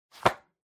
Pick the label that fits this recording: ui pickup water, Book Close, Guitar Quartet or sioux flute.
Book Close